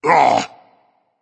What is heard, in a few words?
ugh berserk; cuchulainn